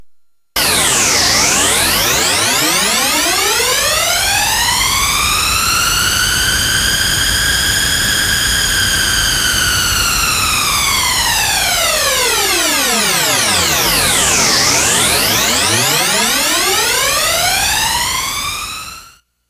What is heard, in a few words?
noise tri; Scott Stites